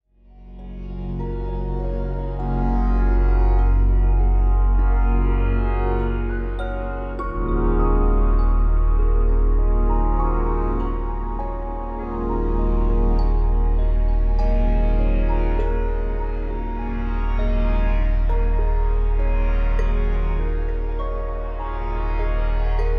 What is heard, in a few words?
Deep Relaxation 3D RELAX